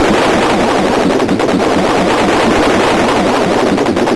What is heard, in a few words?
Arcade Riffs